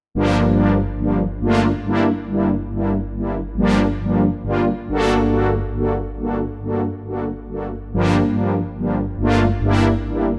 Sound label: OB8 LFO brass; opx pro3